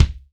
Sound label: KICK, MG KICK